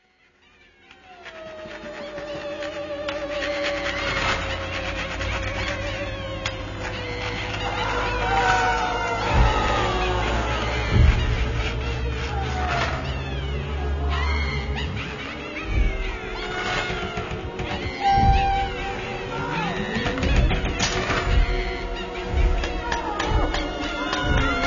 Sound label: witches laughing torturing